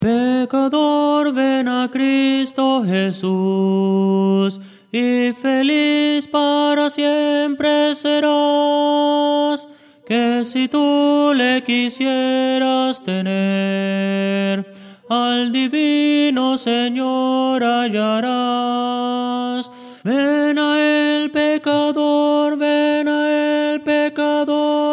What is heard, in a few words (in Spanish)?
tenor